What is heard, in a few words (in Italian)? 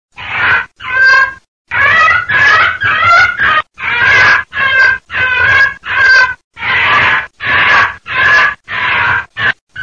VOLPE